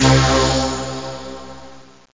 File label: fat sq, keyboards; Zoom - Release 2; Active Software